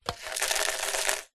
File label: noodle pot dried plastic shake fast; zapsplat